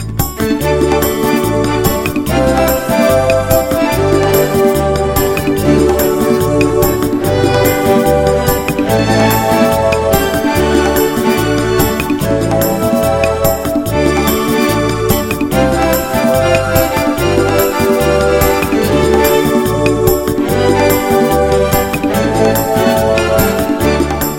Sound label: Beautiful and Soft